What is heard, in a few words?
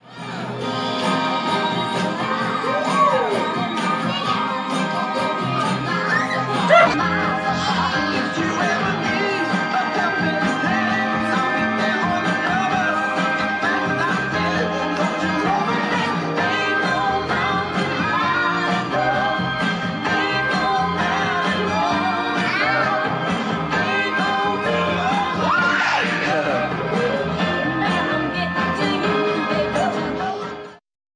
dances tv